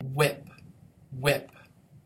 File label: whip